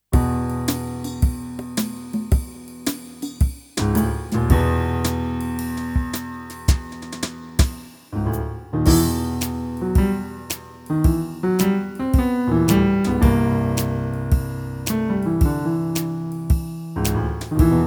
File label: menu music; super snuvi